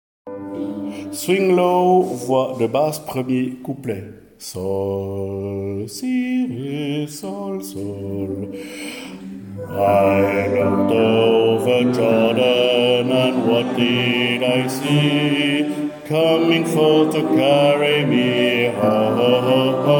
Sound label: swing low sweet chariot basse 1er couplet